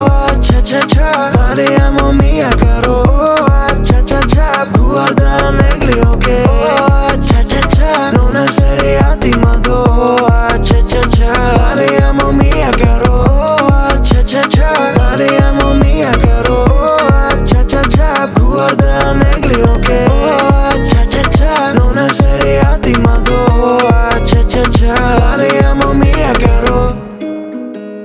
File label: cha cha cha